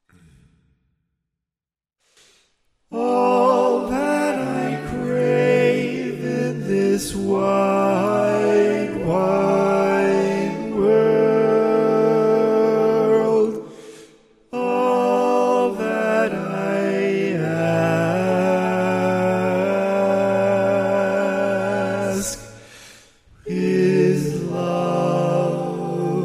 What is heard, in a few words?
Bari, barbershop tags